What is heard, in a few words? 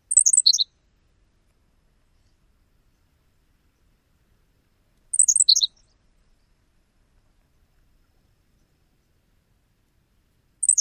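songs, bird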